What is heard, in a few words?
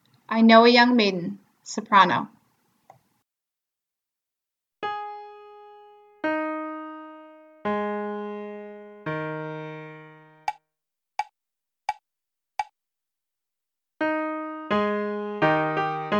soprano; young maiden